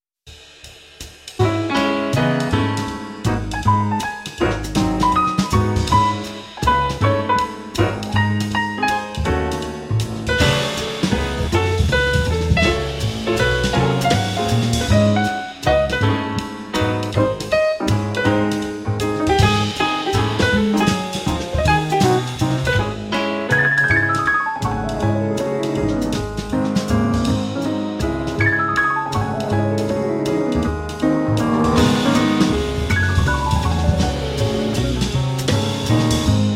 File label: In C-Sharp Minor, Arr. For Jazz Ensemble